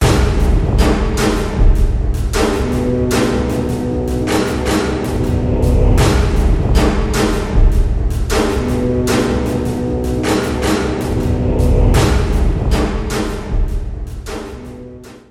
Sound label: Attack Loop